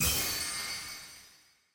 ui choice button click; tft lny2022

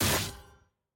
aah; gwen

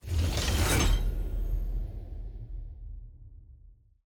loot open intro

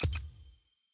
lobby button banner click; parties

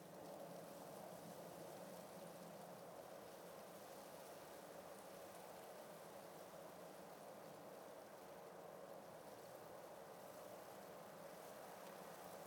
amb map; lol

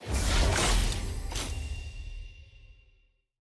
loot reveal shard rarity legendary

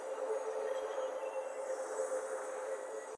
capsule loop; clash

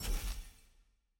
card hover; champ select; aram

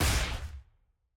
aah; sett

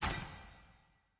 mission pane active click; tft sgo2021

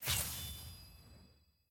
ranked ui circle meter level up; postgame

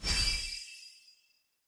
success; starshards

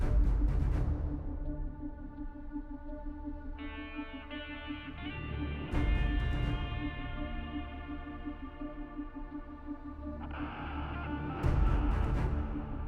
music inqueue; odyssey mode

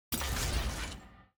tier wings promotion from bronze; ranked

